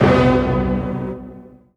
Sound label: GOOD HIT; Q Up Arts; Dream Experience